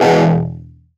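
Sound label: plucked E major; Polyend; Simulacrum Sounds; 1 Shots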